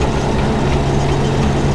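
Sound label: door mine loop; doors elevators; Star Wars Obi-Wan; XBOX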